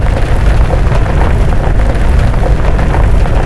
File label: grass; World Racing; XBOX